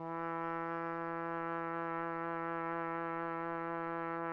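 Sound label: trumpet; Roland - LA Composer vol. 3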